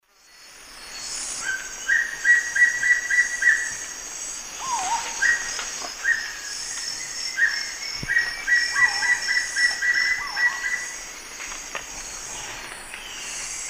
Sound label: Piprites chloris, song and calls; S. America; Eastern Lowlands